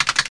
key; jobsaver

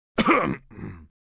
cough

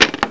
Jig Piece Mate; Casady & Greene; Crystal Crazy Demo; Build the jigsaws

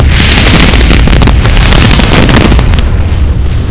FIRE; The Ultimate Guide to Mortal Kombat

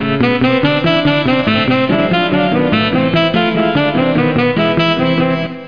Mystery Sax; Museum of Anything Goes

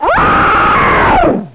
Creature Dies; Siege of Darkwood 1.0

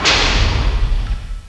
bash

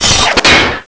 off hinge

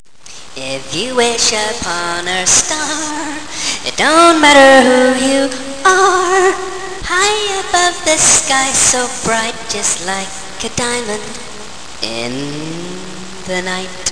wish star

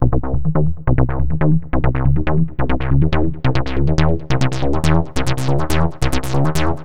BASS; Evolution 077

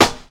snare

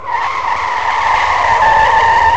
scree

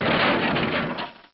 CRATE; shogo

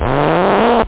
zirrrp, scratch; da capo vol1; instruments

